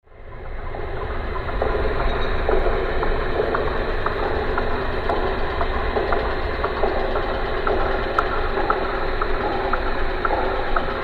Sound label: sonar sys